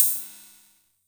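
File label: Cymbal, Korg KR-55B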